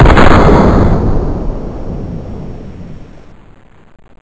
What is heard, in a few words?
glock fire